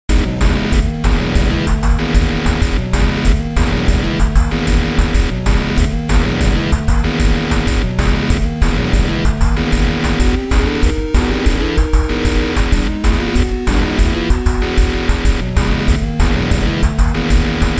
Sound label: chase song, BeepBox; chase theme; CDMJ